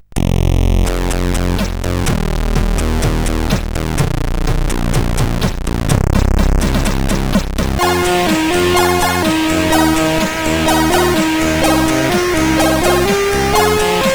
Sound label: Acidtime sid CSG8580R5